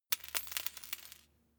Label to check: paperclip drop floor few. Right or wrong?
Right